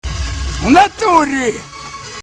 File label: nature